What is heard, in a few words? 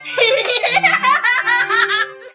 laugh; Bambi; Thumper